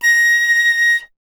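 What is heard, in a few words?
FLUTE PICCOLO A#; Miroslav Vitous - Solo Instruments VOL-2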